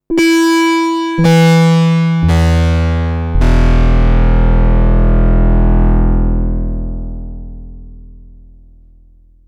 no Detune

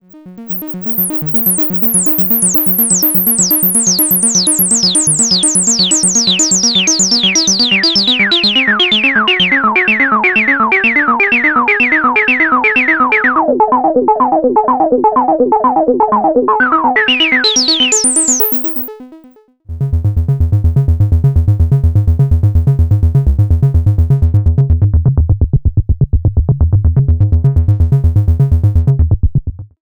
Behringer Modul 110

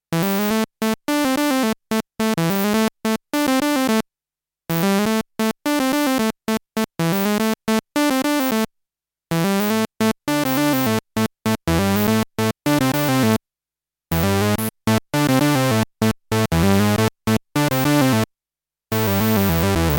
Vermona; Vermona 14

